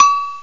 hi agogo, drums; gmplay

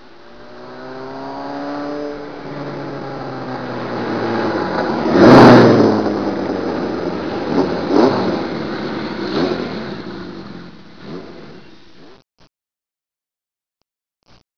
MOTOR; Talking E-Mail

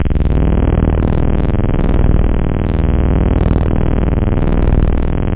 analog; da capo vol1; instruments; synth

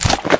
vest take

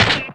Ground Bullet Hit; Red Shark

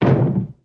thump

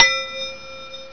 Blocker Hit; Blockers & Finders

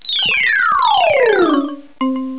fall hole

